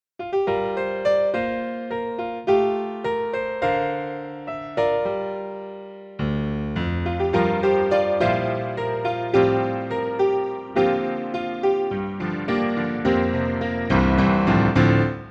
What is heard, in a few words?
Imperial PopRock; Montage